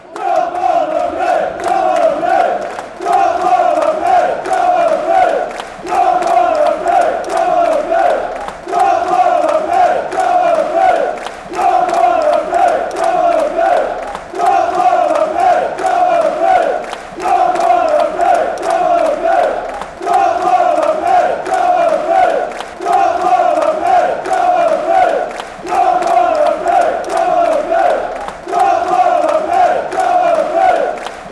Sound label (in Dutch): lokeren zingen, fanchants